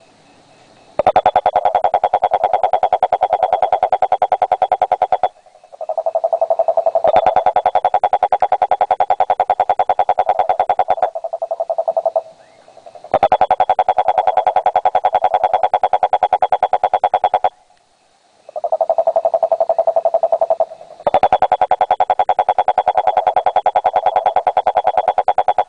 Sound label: Rhinella schneideri